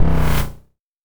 Powerdown; Cobalt Core

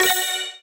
Block Combo; Ui Block Combo1; Kasiori Arcade; Switch, Switch 2, Windows

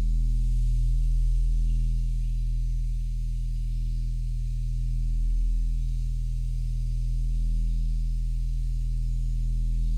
Sky Drag Fast

